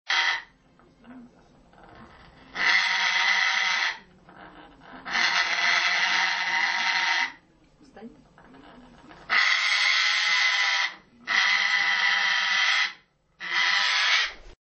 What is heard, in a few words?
african grey parrot